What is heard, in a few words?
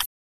button Down; football